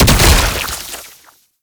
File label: Proto Infested Brachiolyst Projectile Explosion; Warframe; Abilities